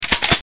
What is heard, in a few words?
Switch Silo, gun; fishing3